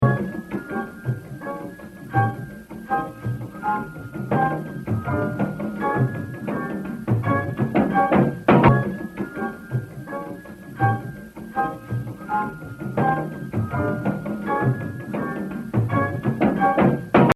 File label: Exercise 3, Jazz Drumming - 72%; Baby Dodds; The Evolution of Jazz Drumming (Gottlieb)